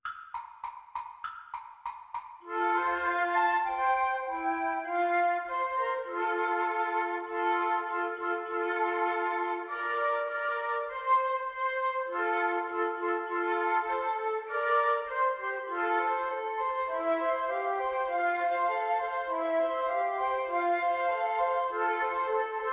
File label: early one morning, Flute Trio